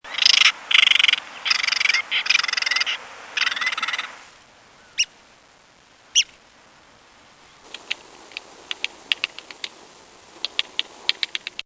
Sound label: Woodp Red Cckd (short)